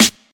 raw snare A key, steel snare drum sound tuned to the A note, Loudest frequency: 3875Hz